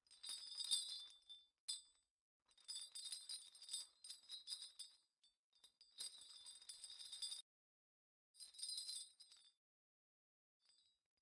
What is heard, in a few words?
generic chain hang freely; Hollow Knight: Silksong